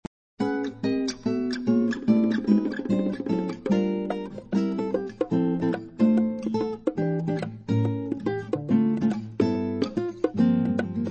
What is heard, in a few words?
Acoustic Moods